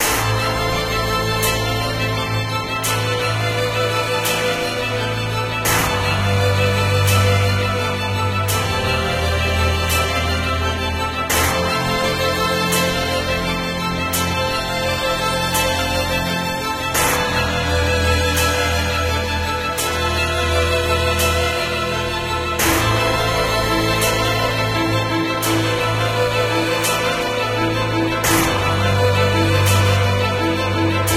Future World Violins Loop; Jolt Super Robot Racer; ps4 switch wii u windows gamerip 2016

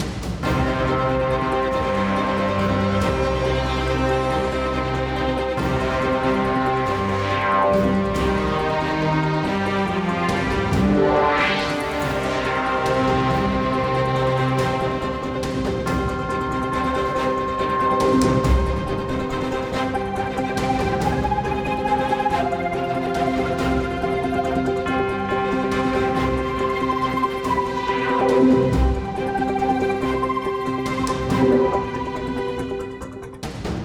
Enemy Turn

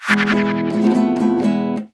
Mariachi Guitar strum; WrestleQuest

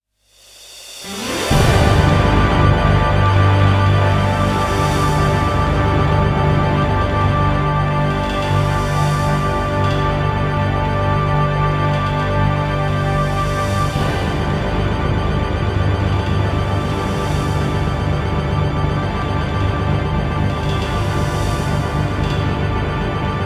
Final Match Goal Rush BGM

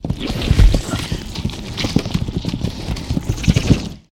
Swarm Inside Wall

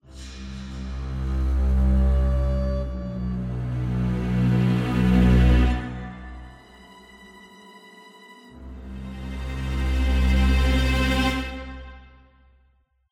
Orchestral Horror AREA INTRO; unrest indigo

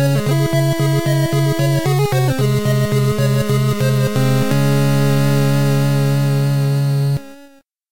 bgm game over

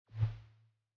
Forest Harmony Grid Flowers Bud A2; Forest SFX

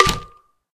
Egg Tap Wood

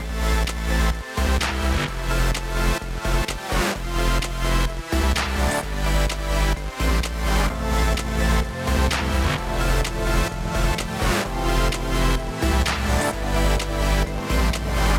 Music Loop

